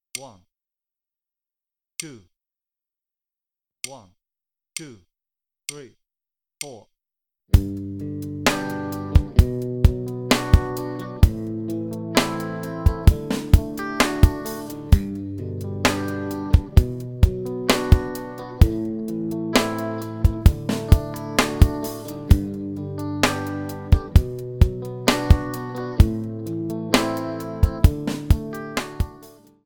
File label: Play-along, Bass; Bass Unlimited